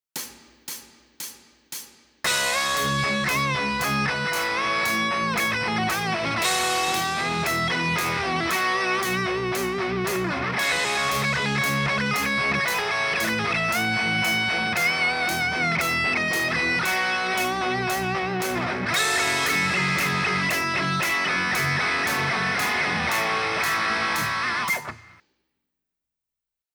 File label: solo 115